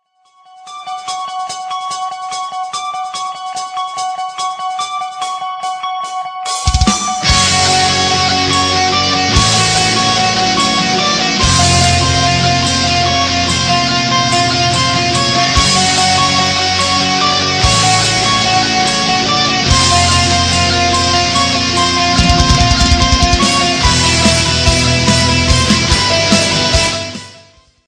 halloween metal ringtone

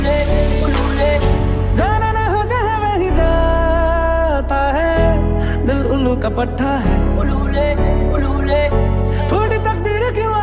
dj remix ringtone

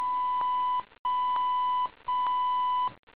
box tones ringtone

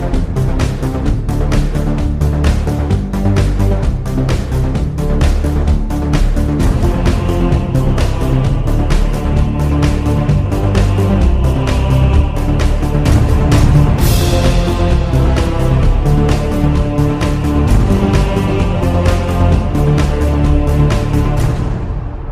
english music ringtone; wonder woman; english ringtones

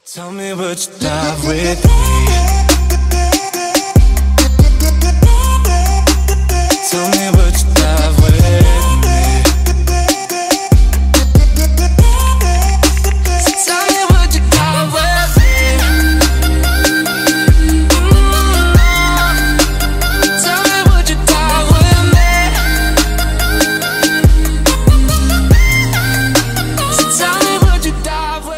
samsung galaxy ringtone

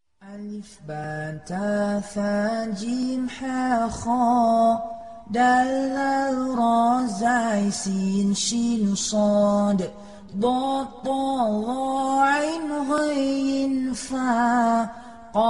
arabic alphabet nasheed ringtone

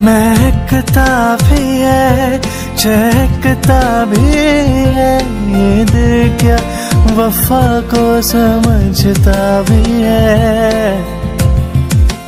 bollywood ringtone

